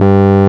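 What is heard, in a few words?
RMI piano G 1, EP; Sonic Reality - Vintage Timetraveler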